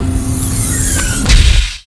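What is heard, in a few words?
door close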